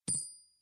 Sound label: coin